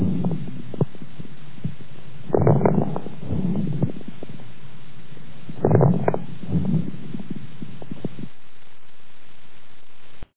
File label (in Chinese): 湿罗音中水泡音